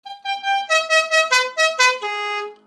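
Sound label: Call To The Post, musical car horn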